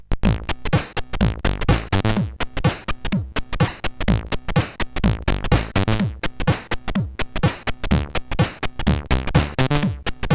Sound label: Dusty Metal, sid CSG8580R5